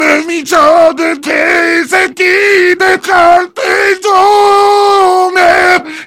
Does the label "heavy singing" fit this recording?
Yes